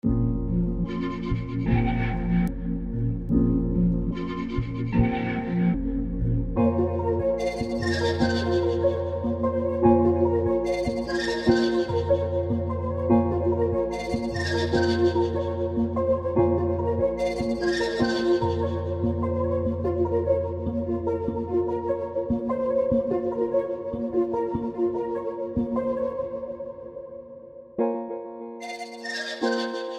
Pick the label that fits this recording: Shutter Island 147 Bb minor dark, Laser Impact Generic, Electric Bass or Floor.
Shutter Island 147 Bb minor dark